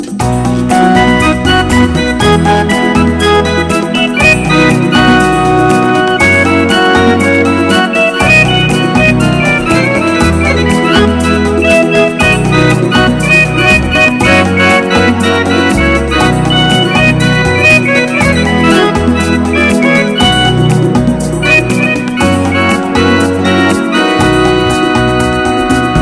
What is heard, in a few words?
Great Dancing Music